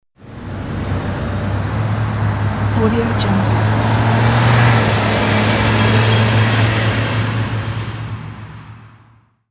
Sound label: car passing by